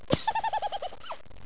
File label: giggle